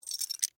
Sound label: Jewelry Rings, Pick, Up, From, Hand; Foley